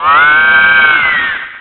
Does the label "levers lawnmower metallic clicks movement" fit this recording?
No